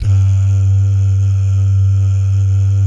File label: DAH, DAHS GUYS; Spectrasonics; Vocal Planet; Jazz FX